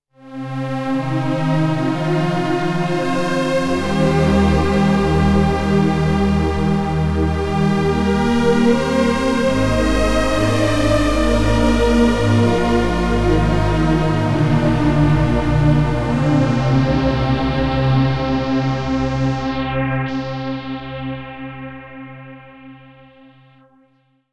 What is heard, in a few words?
Space Strings, Korg